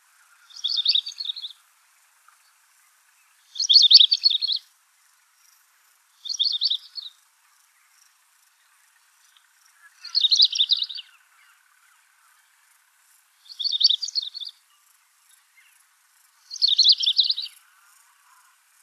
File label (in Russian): dlinnohvostyy snegir golos